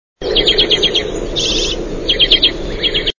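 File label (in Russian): chechetka, redpoll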